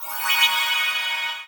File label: unlock; Tiki Kart 3D